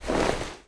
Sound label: foley gear rattle walk